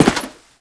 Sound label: Step Default